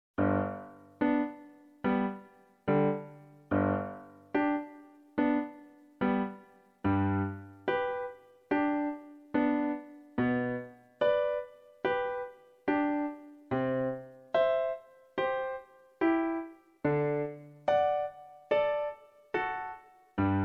basson sonata, fagott; saint-saens; ファゴット用伴奏CD

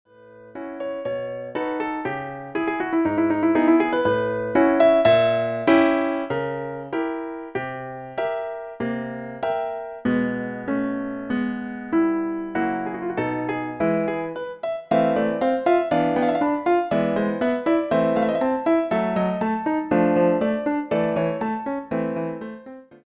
arpeggione sonata; クラリネット用伴奏CD

